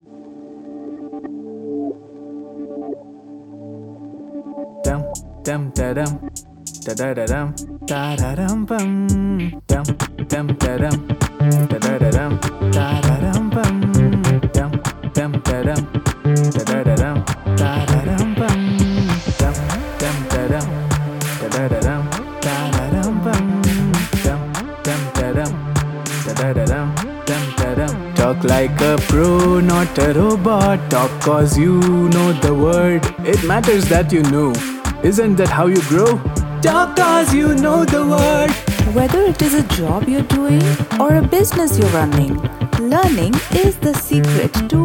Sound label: Brand Promotional Song; corporate